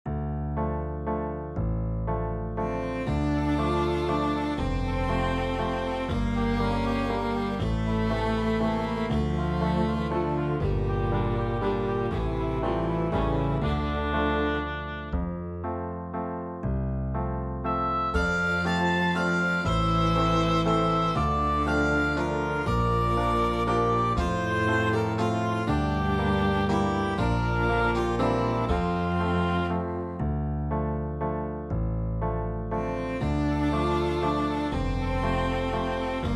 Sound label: vinyl waltz